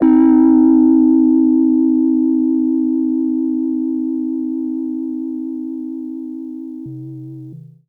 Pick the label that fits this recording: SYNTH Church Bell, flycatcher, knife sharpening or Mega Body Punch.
SYNTH Church Bell